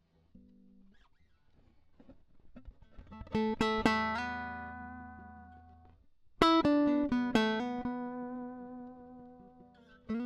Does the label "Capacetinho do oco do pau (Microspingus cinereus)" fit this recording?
No